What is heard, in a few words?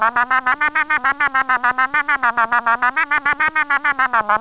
bleep